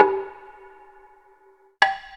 woodblock reverb; best service Blue Box; percussive fx

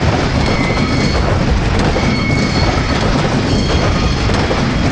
Grind Large Metal Door Loop; Doors

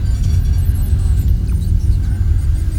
Scifi Amb Control Loop; SFX Kit; Sci-Fi Loops

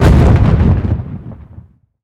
Explo Medium; SFX Kit; Destruction

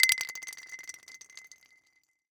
Test Tube Drop; Medical; Blastwave

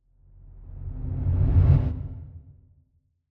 Reversed Low Strings - Reverse - Short; Hollywood Edge; sound designer's toolkit v2

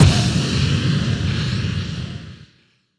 POW; XXL 800 Production Effects

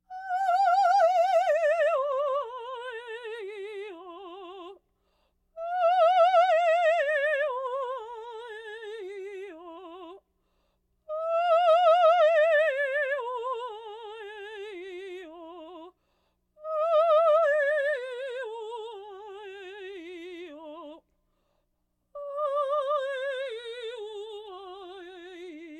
Laugh tracks

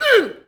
Boxing Female Punch Grunt

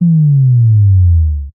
Low Descend; Sci Fi; 1001 Sound Effects

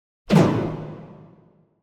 Symphonic Hit Mixed Up - Strings; Hollywood Edge; sound designer's toolkit v2

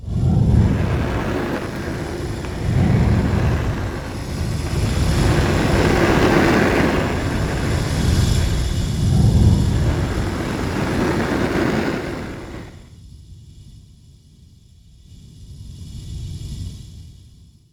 gas; Inquisitor 2

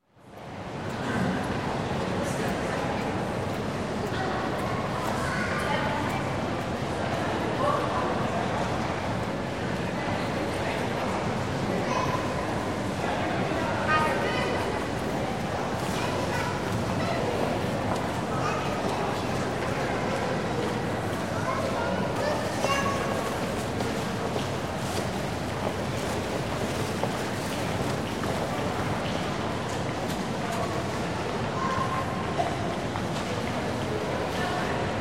Shopping Mall Small, Indoor Footsteps And Voice; Store; Sound Ideas 2000 Series

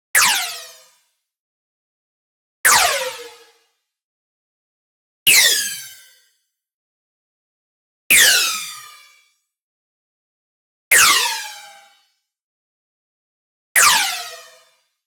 LASER Rapid Shot; Anime Essentials

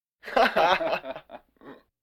Vocal effects breathing Moaning Baby

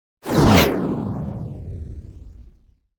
Bomb Firing Pass By; Hollywood Edge; sound designer's toolkit v2

